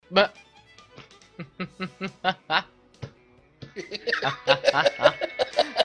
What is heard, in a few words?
laughing; cupcake and pepper